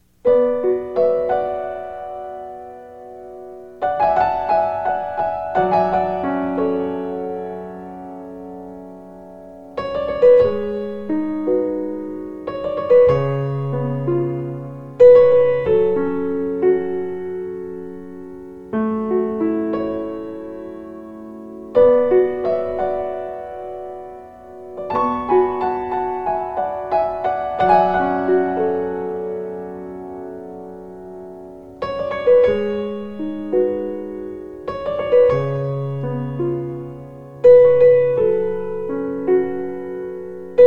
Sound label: Piano